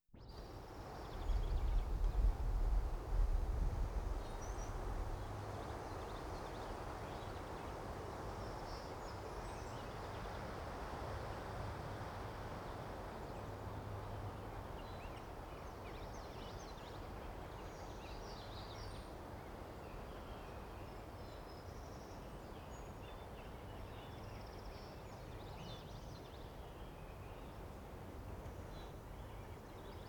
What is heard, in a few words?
Pines and Birds; Electa Quinney